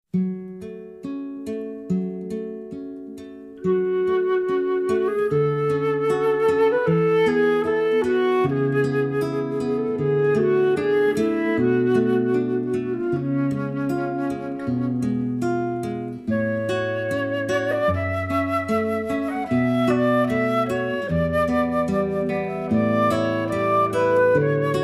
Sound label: guitar; faure